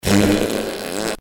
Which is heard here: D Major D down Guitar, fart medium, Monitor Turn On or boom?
fart medium